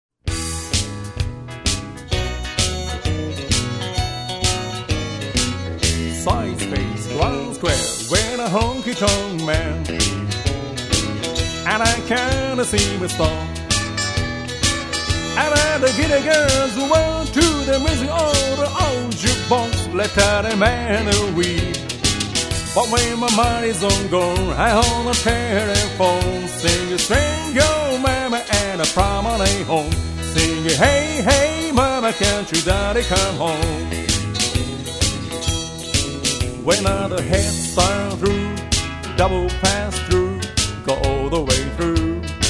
vocal

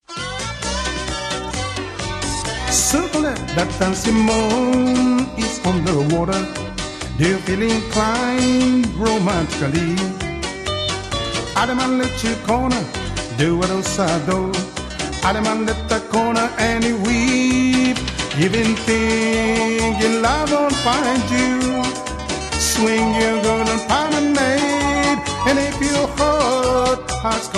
dancing moon vocal

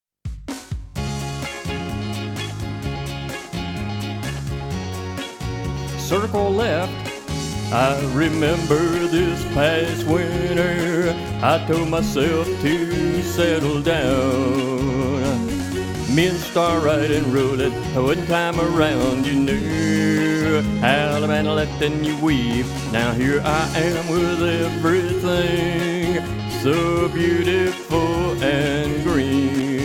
vocal

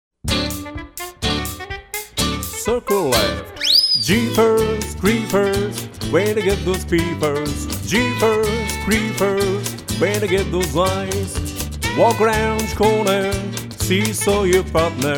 vocal

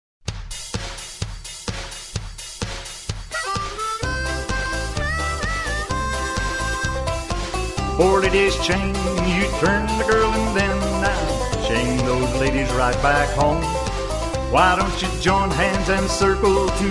rocktop vocal

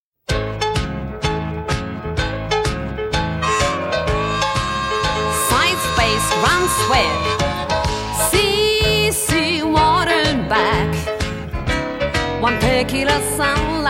cc water back vocal

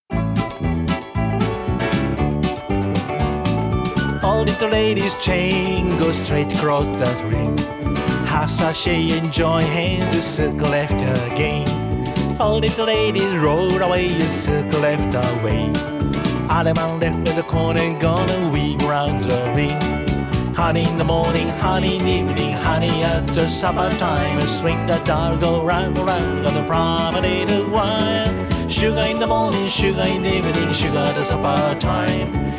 vocal

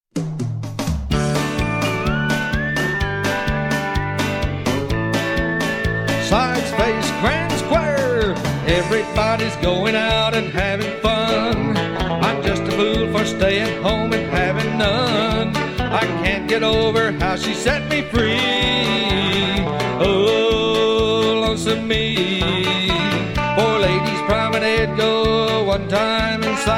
vocal

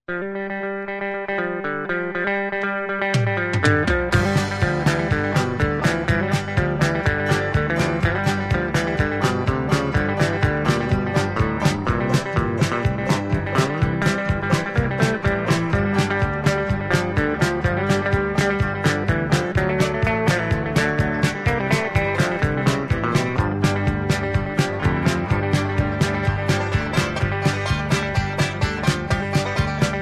hoedown, square dance music

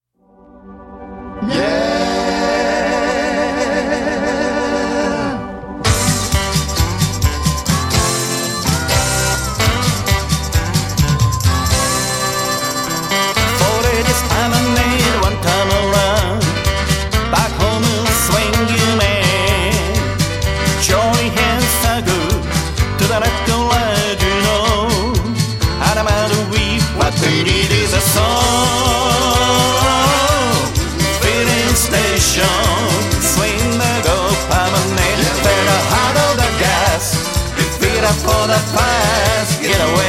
soul fillin station vocal

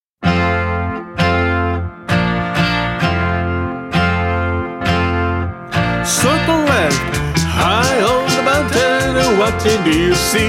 vocal

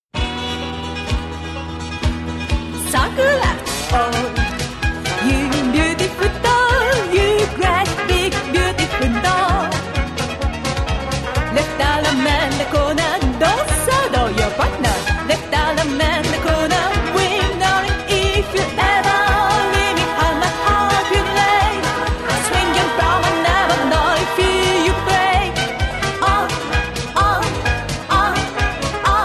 oh vocal

